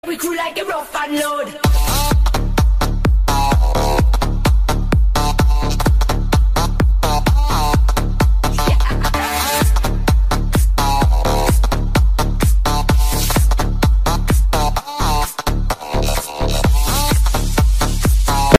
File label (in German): tod electro